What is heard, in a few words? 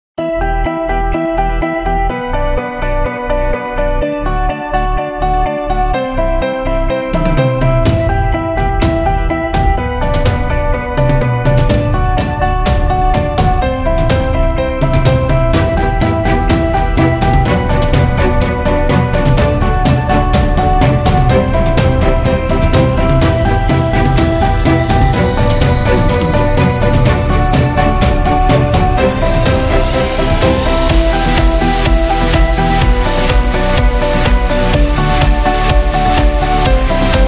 Cinematic Upbeat; corporate music vol 15